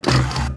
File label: door open